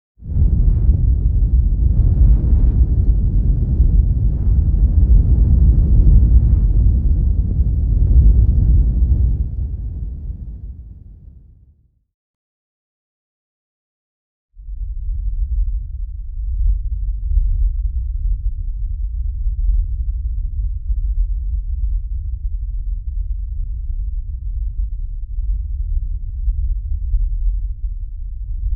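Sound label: fire continuous low frequency rumble; Asian Show Sound Effects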